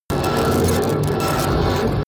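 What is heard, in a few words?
sizzle screech machine, Science Fiction; sciene-fiction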